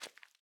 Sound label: jump concrete; The Swapper